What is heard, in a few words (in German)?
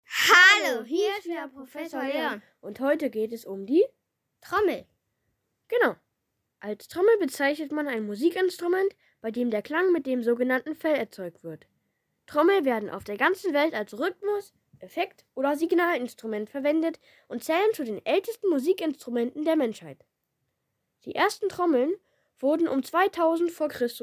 trommel